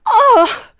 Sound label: dyin; nwnds audio